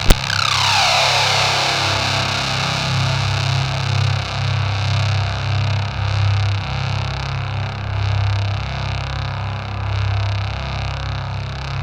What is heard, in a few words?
ZWEEP; Spectrasonic Distorted Reality 1